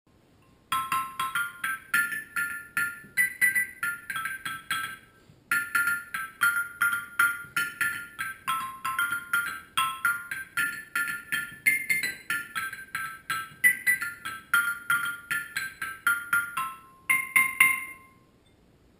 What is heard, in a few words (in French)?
musique, instruments insolites